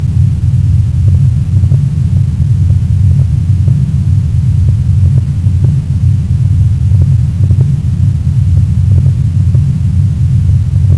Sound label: drone; svencoop